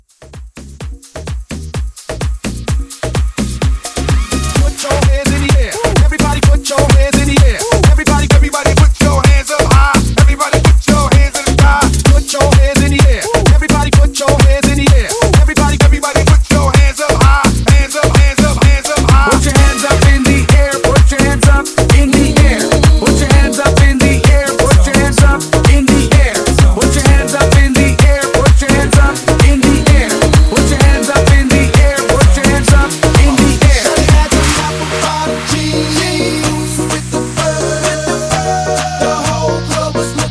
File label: Merengue Hype